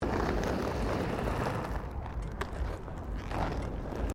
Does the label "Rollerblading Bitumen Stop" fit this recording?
Yes